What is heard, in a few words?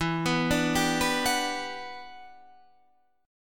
G Major 7th, E, Gmaj7/E chord; arpeggiated; JGuitar